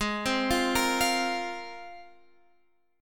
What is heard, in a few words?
G sharp 6th Add 9th, Guitar, Open F; G#6add9; arpeggiated; x,15,12,12,13,12